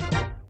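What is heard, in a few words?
fanfare end of ball, pinball; WILLIAMS; INDIANA JONES; Indiana Jones Original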